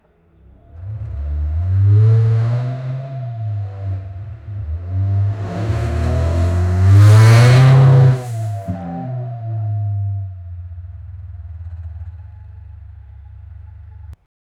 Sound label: AMG GT63 S V8, sports cars; 2021